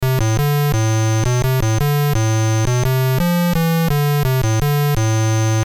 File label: Music; BASIC Demo Cart